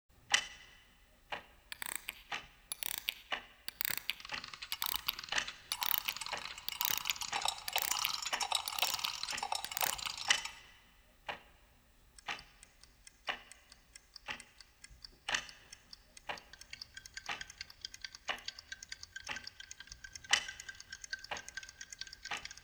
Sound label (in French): Voyage En Train Binaural; Arman